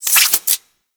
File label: Claws Retract A